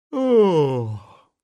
Middle aged male yawn sleepy

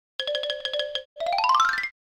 Cartoon run away

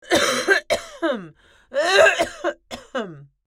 Female old woman coughing; cough-sound-effects

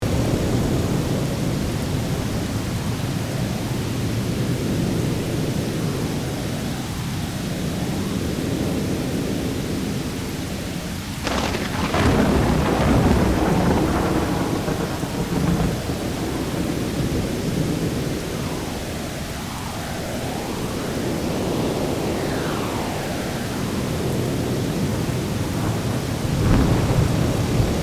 Stormy alien space city

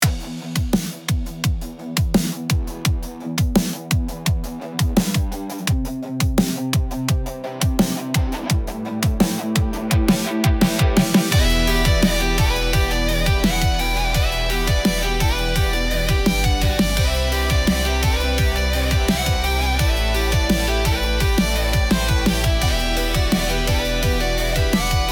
Sport intense game on

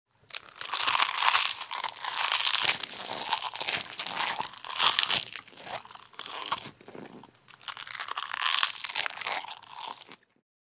Eating vegetables crunchy munching

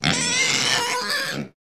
Pig squeal

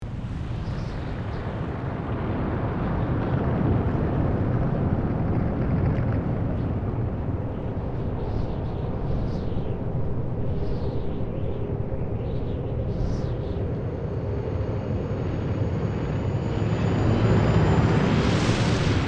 Icy planet with large vehicles

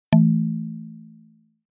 Error notification alert

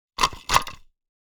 Silicone sealant gun single application; hand tool

